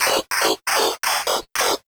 Electro Loop 128BPM; Vengeance Samples

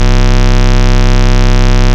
Ultimate Bass Root C; Vengeance Samples

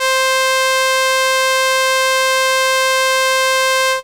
Viola C5, ARP Solina Unmodulated; musicradar-synth-samples

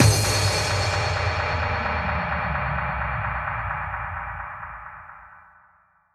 FX Downlifter

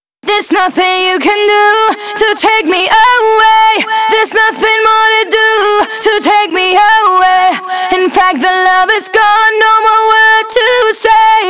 Female Vocals WET, 126 BPM; Vengeance Samples; Vocal-Hook Kits; Song Kit

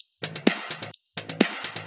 House loops 128BPM; Vengeance Samples

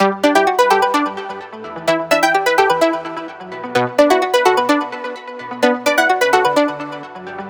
Special Sounds Root B 128 BPM; Vengeance Samples; VEH3 Special Sounds

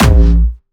A#1, Jumpstyle Kick; vengeance essential club sounds-3; VEC3 Bassdrums

